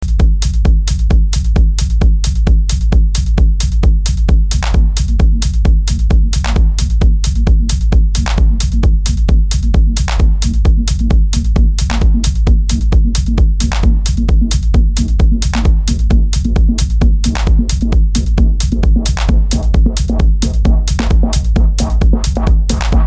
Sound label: Drums N Co